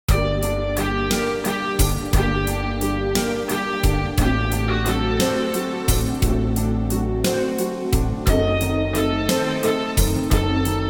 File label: modern piano minus